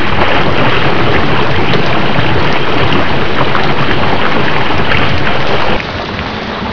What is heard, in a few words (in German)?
ambient wasserfall; hurrican